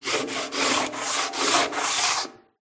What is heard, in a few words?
industrial metal drum cleaning scraping sanding grind